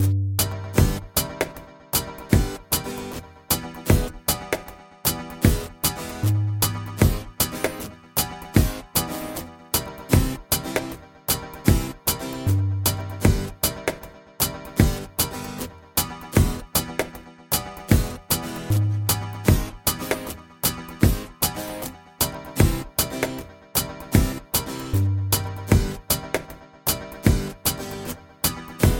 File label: Reggae